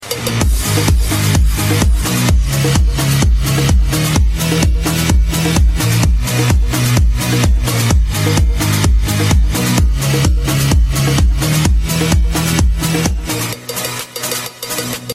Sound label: latino